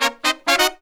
Phantom Horns, 132BPM; Zero-G

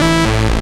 chord 1, sound chord; Ueberschall; Techno Trance Essentials

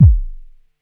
Axxe Kick, ARP Axxe Drum Machines

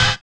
collision; Spectrasonics; Metamorphosis

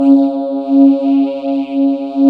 VOCODER; Sound & Vision - Gigapack I CD 2

